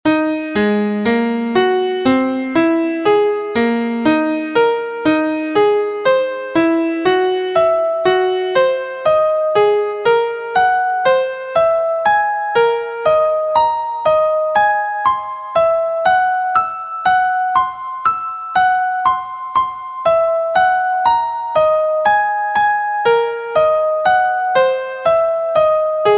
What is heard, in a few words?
Degree 1 2 3 5 b6 b7 Atomic Scale Key Ab; harmonic and melodic equivalence; trichord pair